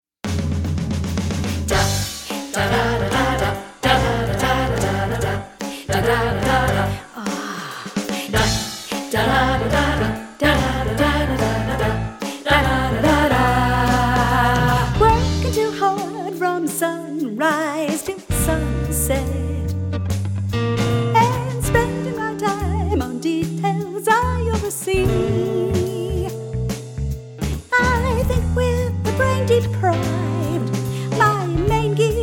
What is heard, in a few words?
Rock n Roll Jazz